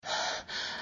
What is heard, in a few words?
Breath; Lewd SFX